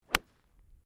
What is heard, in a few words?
GOLF SWING IRON HOOK; Golf Sound Effects; Various Golf sounds; Golf (Sound Effects:Sports)